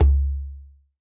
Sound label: Djembe - C#; percussion; Cymatics - Infinity Beta Pack 2.0; Zeus Percussion Collection